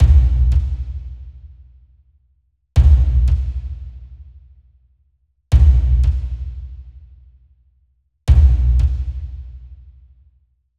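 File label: Deep Drum Loop Am 87Bpm; Construction Kits; STEMS; Ghosthack; SkyRealm; Kit 18 - Shivering Sea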